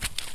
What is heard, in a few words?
mud footstep; everness